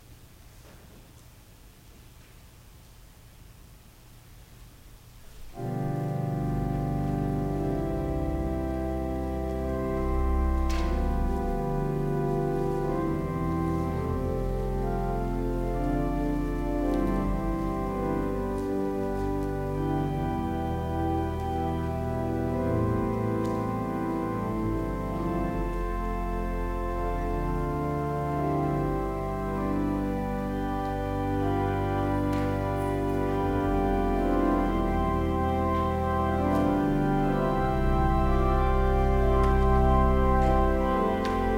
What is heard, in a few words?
Organ Music; jesus mercy to one taken with palsy 3